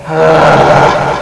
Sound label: growl; Sound Library 2000; Wayzata Technology